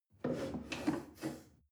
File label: chair wood take slide soft